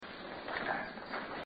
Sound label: hands; Basement4